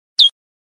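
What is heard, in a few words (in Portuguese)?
Canto de Pássaro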